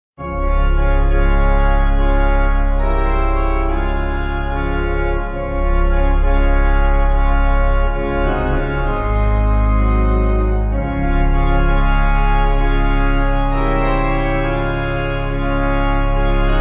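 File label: Pipe Organ, Music Backing Files for Churches; O To Be Like Thee; Rondinella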